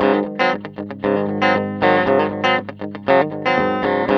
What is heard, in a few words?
Tele 7th A, guitars; Spectrasonics; Hans Zimmer Guitars VOL-1; TELE7THS